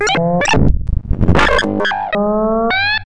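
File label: dubstep alert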